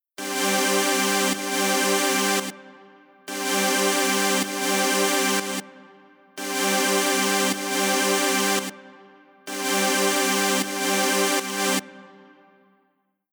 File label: Future Bass Chord